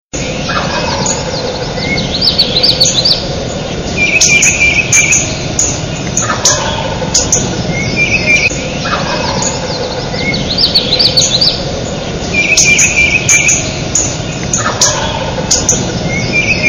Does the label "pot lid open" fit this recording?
No